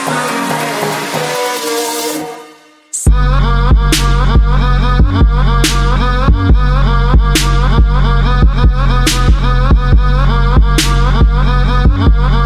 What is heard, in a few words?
Clock